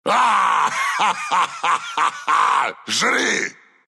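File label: laugh, Russian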